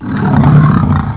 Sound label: pain, monsters; AoMClassic